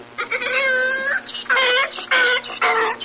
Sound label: Donkey sounds